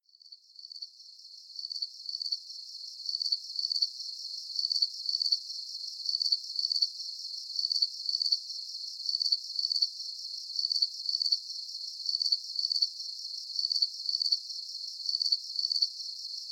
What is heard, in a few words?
insect chirping bed background night